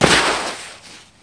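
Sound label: slide dirt; Hardball 6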